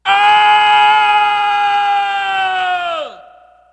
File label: mm aaaah